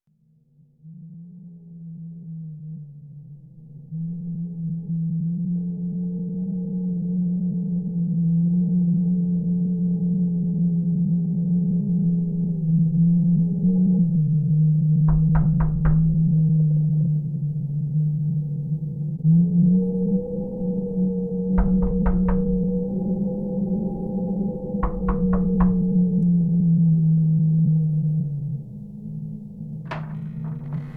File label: wind sequence and door knocks and latch; Sound design for the opera composer: concepts and methods; Her face was of flowers audio cues